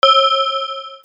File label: BELLS